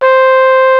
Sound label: flugel C5; Greytsounds; Scott Peers Sound Engineering